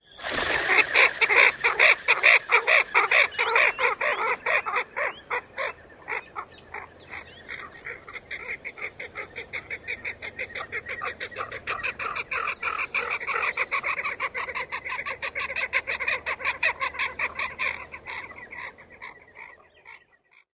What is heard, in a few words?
flight whistle and quacks